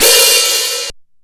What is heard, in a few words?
CYBER KIT, DRUMS